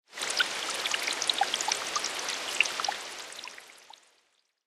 rain on water; factorio

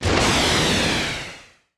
rocket turret launcher; Factorio

